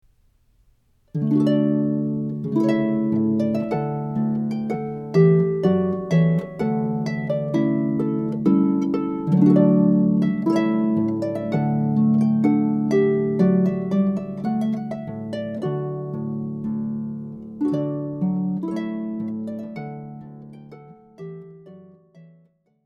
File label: Trumpet Voluntary; Purcell